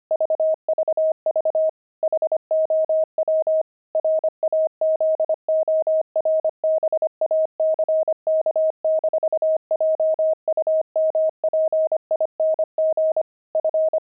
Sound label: pangram frogs; Intermediate Pangrams 25cpm